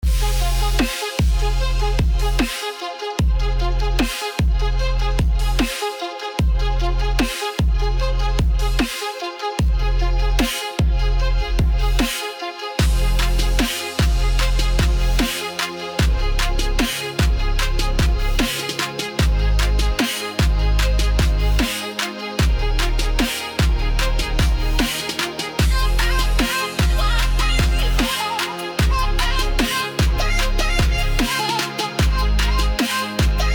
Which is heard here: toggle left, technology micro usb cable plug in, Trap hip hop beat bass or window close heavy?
Trap hip hop beat bass